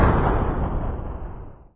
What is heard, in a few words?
boom